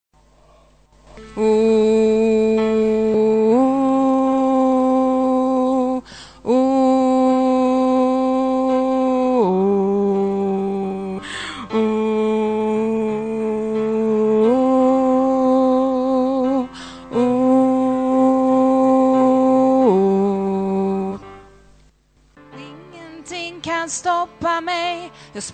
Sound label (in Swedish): bas